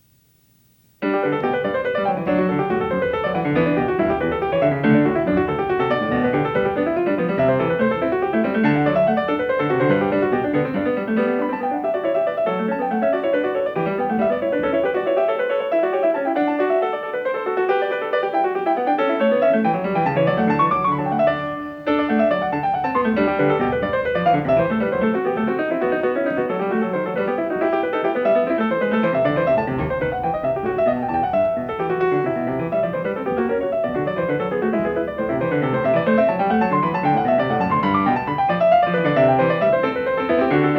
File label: Clav; Johann Sebastian Bach; Goldberg Variations, BWV 988; Variatio 8 a 2